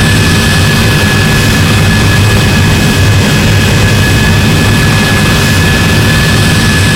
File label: jet rear, engines; War Thunder